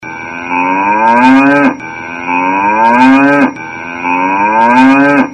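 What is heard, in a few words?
cow mooing; bo keu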